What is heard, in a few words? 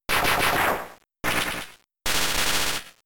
Thunderbolt